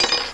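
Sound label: Concrete Hit; Icebreaker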